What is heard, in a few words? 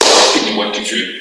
spank